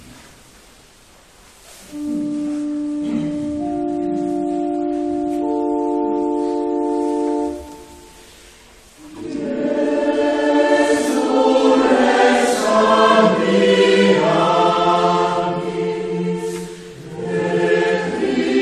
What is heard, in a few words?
Jesu Rex Admirabilis, corale